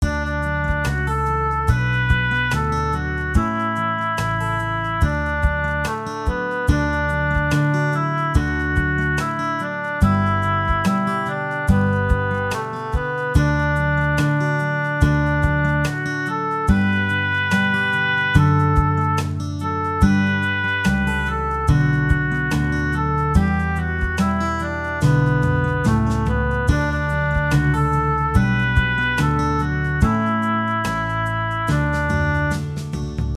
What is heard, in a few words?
Loch Lomond guitar